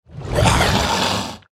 Piranha Swarmer

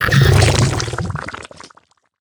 Seeping Oozling Death

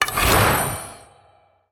Wand Bright Play Underlay

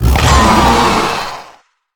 Attack; Veranus

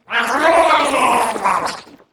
Corrupted Seer Play

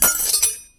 Underlay Death; Defias Cleaner

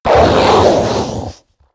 Death

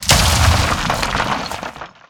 Rock Elemental Underlay Death

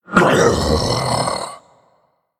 Male Undead Orc Death

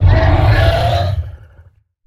Enter Play

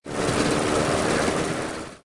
Flying Machine Play

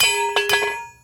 Armorsmith Underlay Death

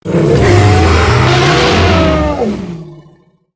Death

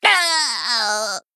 Male Vulpera Death

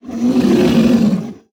Stegodon Death

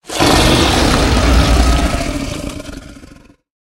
Resilient Bloodworm Death

